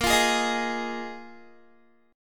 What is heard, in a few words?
Bb 7th Suspended 4th Bb Guitar 4E,4A,5D,5G,5C,6F; strummed